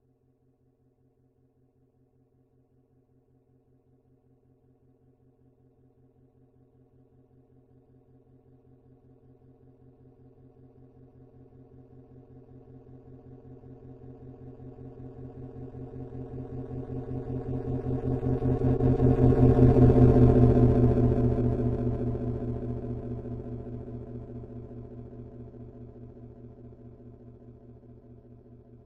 some space truck

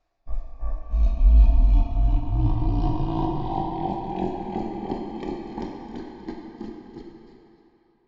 Evil Laugh

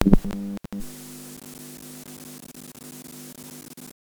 Analog Tape Noise

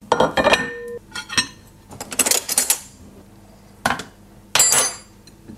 Kitchen Cutlery

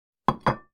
Put Down A Plate

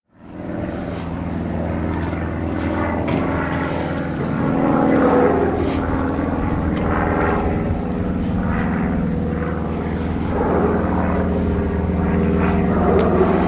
Hiding From Aircraft Run

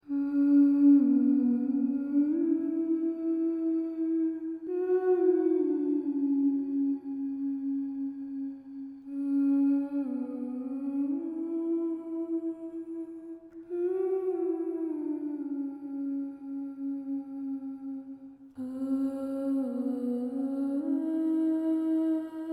Fairy Humming

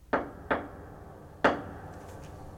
Quiet Knocks

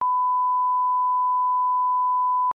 Bleep

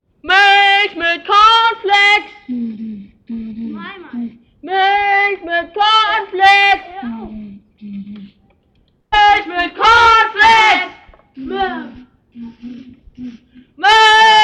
Milk And Cornflakes

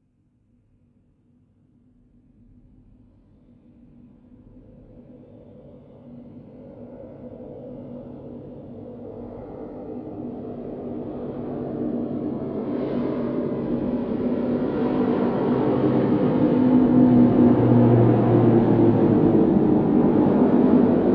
A Propeller Aircraft Passing

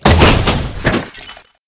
Car falling down

